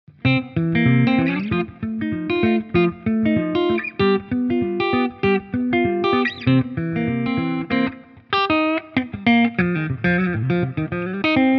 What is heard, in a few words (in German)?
Hagstrom Alvar clean Mitte